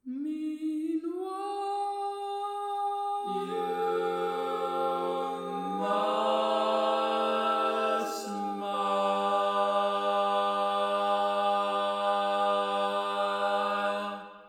Bass, barbershop tags

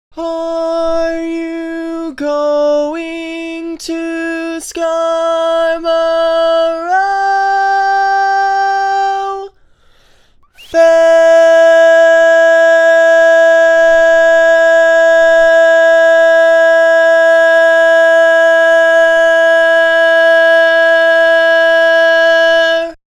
Lead, Barbershop Tags

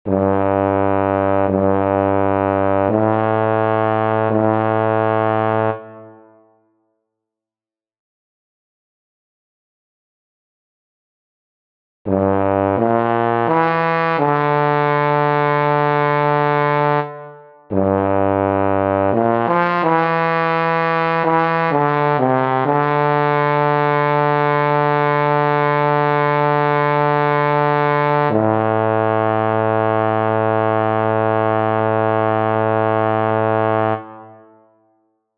Other, barbershop tags

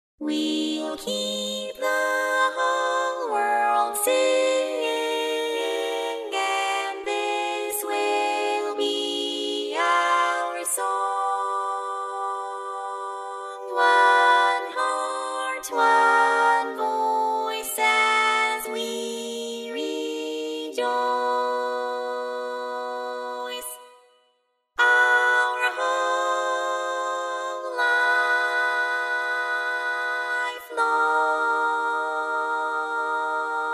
Lead, barbershop tags

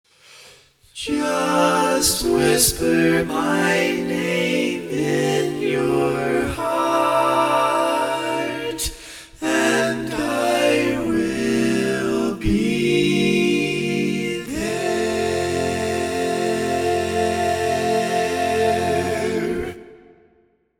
All Parts, barbershop tags

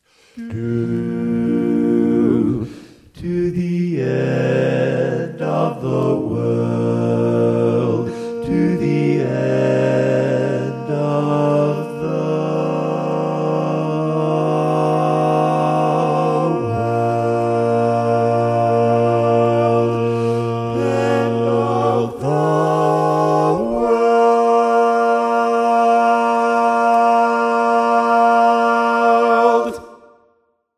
Bass, barbershop

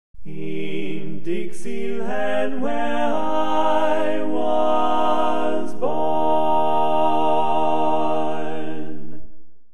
Bari, barbershop tags

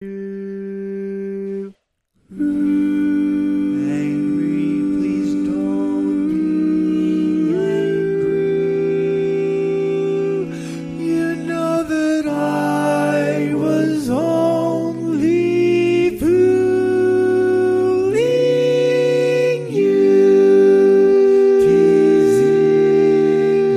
Tenor, barbershop tags; Arranger / Bob & Al Landry

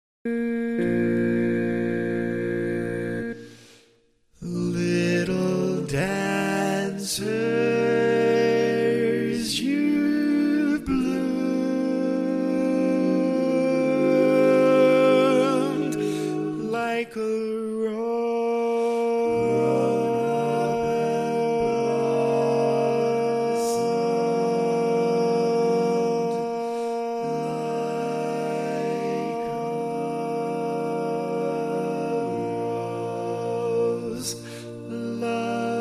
Lead, Barbershop Tags

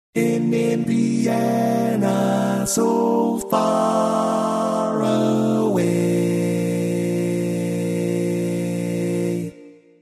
Bari, barbershop

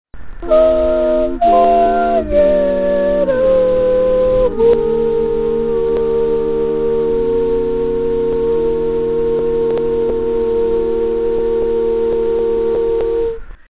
barbershop tags

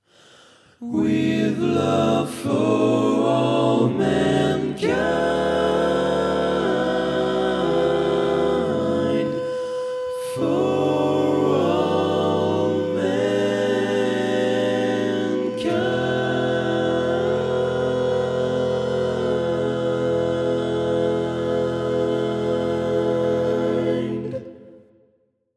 Tenor, barbershop

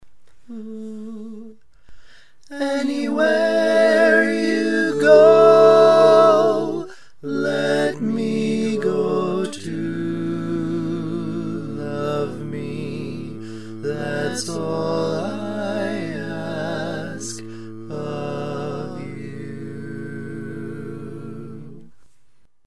All Parts, barbershop tags

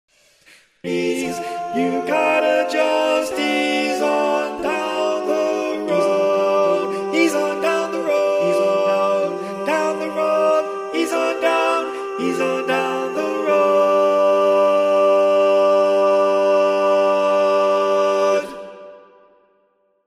Bari, barbershop tags

